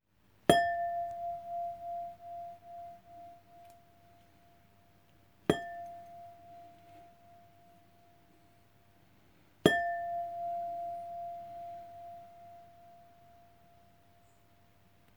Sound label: Vocal